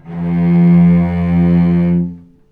VCS ATK F2 L, VCS ARCO, String Collection

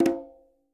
quinto; Sampleheads; NYC Percussionworks

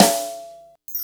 kit, Yamaha Motif-ES8

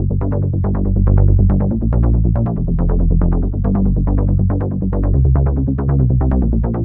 Clubber Bass; Dr. Fink's Funk Factory

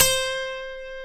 D, funk guitars

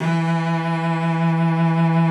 E3, cello vib; Xsample VOL-7

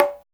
ETHNIK MID, ETH. PERC; Drums In Your FACE!

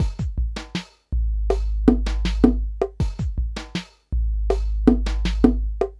PHATT BEA

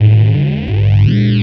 Bass, Futurist Drum & Bass

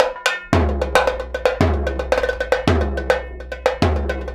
110 BONGO, Percussion Loops; BONGOS

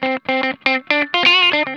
SCRUNCH, Funk Guitar; Zero G - Funk Guitar

